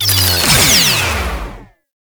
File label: Mine Disarmed; Tau; Twelve Minute War